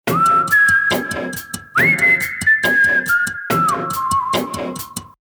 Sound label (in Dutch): HEMA fluitje; geschiedenis hema